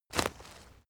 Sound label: step leaves wet crack; schritte